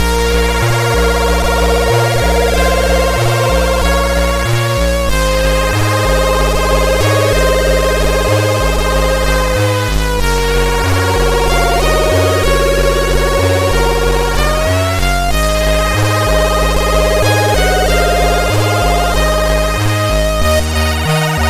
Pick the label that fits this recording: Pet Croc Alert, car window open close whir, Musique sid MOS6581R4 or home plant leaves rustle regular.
Musique sid MOS6581R4